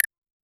button

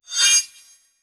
a sparking bling effect, appearance

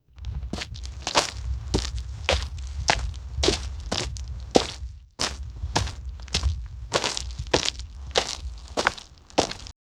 animal hoof footsteps; loose

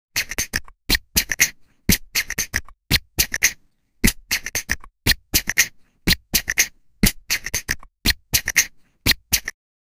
sniffing, mobile phone

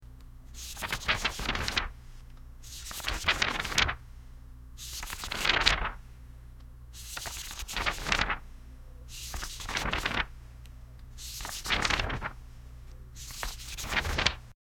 the sound of turning page, library

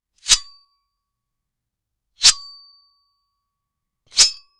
unleashing sword

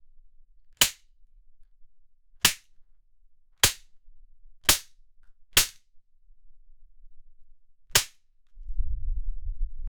someone firing a gun

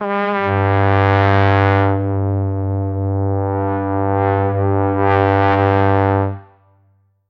trumpet blown heavily in; brass instrument

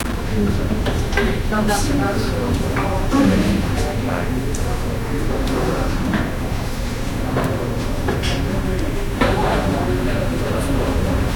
psych ward canteen silent, calm

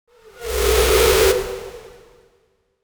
shoosh sound fx; interaction

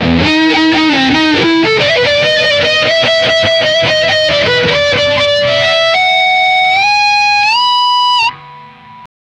guitar pick slide

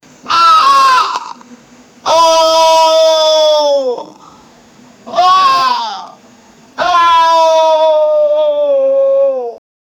cheering ohh, reactions

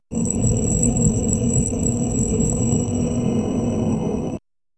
sound of a magic spell, floating

